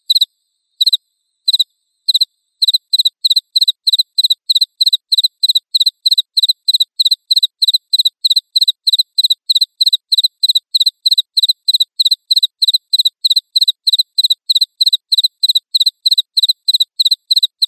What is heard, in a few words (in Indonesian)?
suara jangkrik